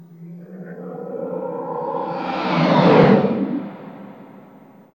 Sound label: Ship flyby; The Official Guide to Babylon 5